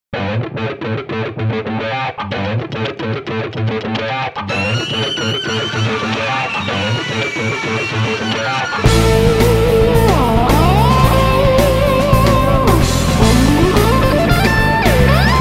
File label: Orange Organ